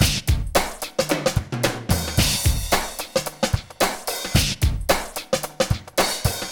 HELP, LOOPS; Best Service; Hyper Dance

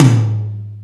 Ambient Rock, E-MU Pro-Cussion

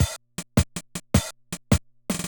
Loop, classic machines, rpm 40

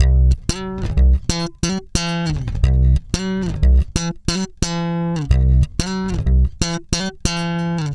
SFNK C#, Bass, FUNK; Spectrasonics; Bass Legends

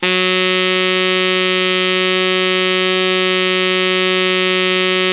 tenor, solo tenor, horns; Zero-G; Phantom Horns

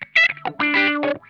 RUDE WAH, Funk Guitar; 90 s Sample CDs; Zero G

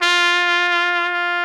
SOLI TRP, Alpine Volksmusik TROMPETEN

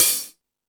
Stadium Rox, Drum Machines, E-MU Pro-Cussion

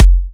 Electro Kicks

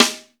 FUSION KIT; Northstar - New Gold VOL-1

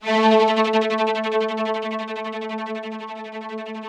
ROUGH STRNGS, Yamaha VP-1; Music Store

